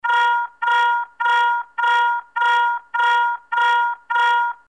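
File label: Iroquois Class Action Alarm, navy; Surface Ship - Action Stations Alarm - Canadian Navy - Iroquois/Tribal class DD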